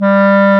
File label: CLAR G3; Roland - LA Composer vol. 1